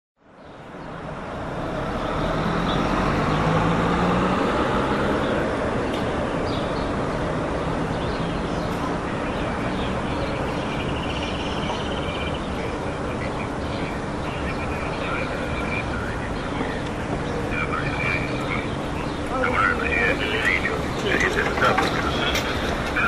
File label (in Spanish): plazas; alfredo palacios bordabhere